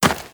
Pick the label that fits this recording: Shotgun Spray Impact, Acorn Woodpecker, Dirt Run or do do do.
Dirt Run